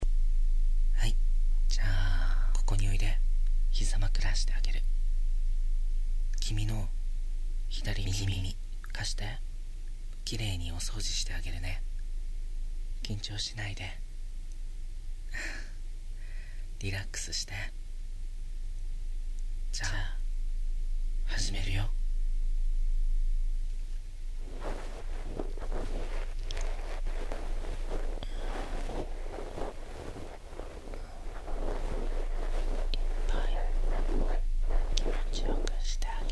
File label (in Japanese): 耳かき（両耳）